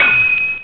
Sword

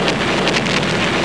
BOOM; GLOBEWAR

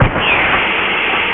steam

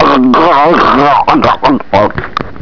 DROWN

